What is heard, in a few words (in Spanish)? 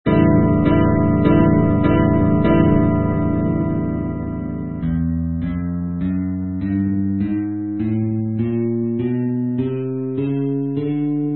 Escala Cromatica